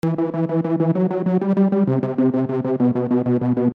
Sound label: Reese